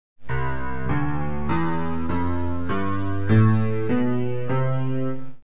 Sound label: scale